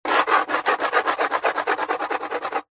foley drink can empty crushed scrapes scratches fast on concrete; zapsplat